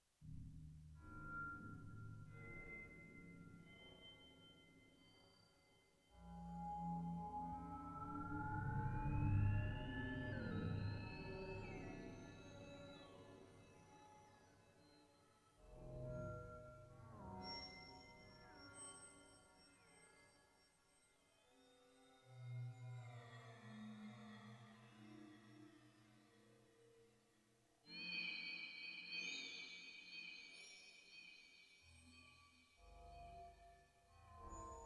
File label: horror raw cursed tubular bells; juno g